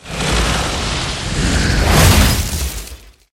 escudo de fuego

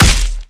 pelea

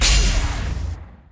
oportunidad aviso

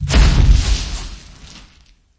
caida

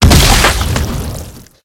caida

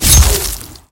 apunyalar

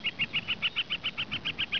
pajaros, animales

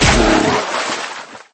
caida

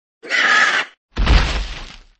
caida

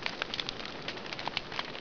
quemar

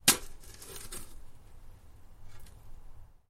cavar

